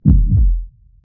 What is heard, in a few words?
heartbeat big